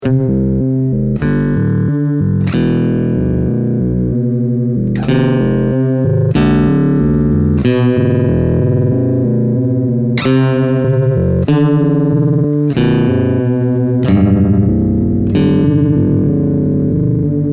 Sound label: Ring Mode